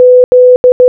Z Morse Code Audio

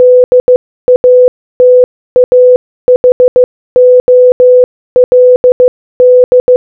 DATA HOLD Morse Code

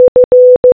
F Morse Code Audio

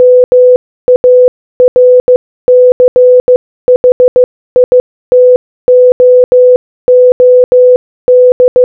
Morse Code Audio

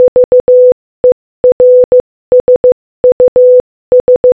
VERSUS Morse Code Audio

